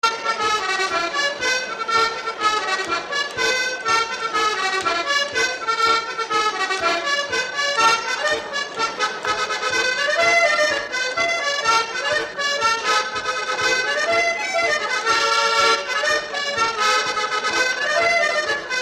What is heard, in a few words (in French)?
sonneurs d accordeon; anthologie de bretagne; pile men